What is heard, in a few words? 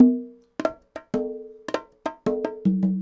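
congas bongos 80 bpm, latin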